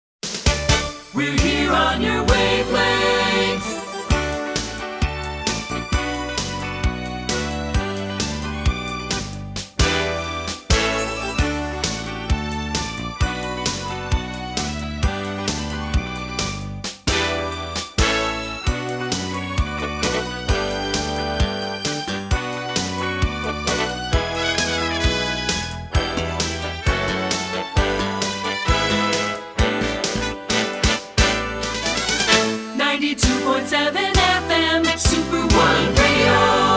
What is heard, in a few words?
Jingle; Short Attention Jingle Show